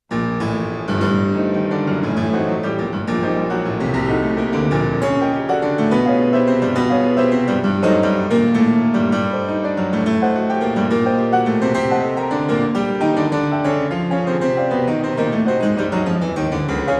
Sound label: Preludes for piano, No. 22 in G minor; Chopin Waltzes 4 Scherzos 26 Preludes